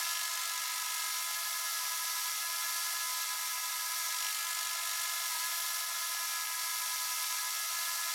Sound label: Ground Bot Small Movement